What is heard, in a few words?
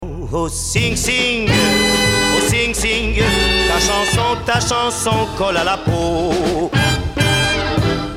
orgues Hammond